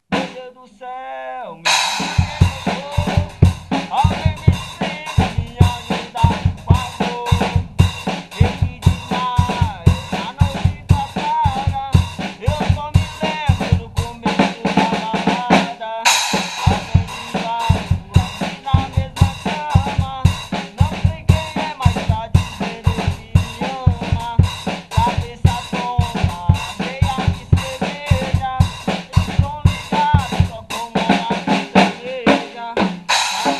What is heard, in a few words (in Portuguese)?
forro balada louca